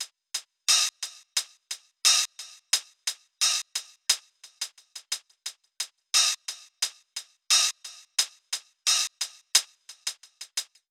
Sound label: Old School Hihat Loop 88 BPM; Cymatics; ANALOG EVOLUTION Beta; Hihat Loops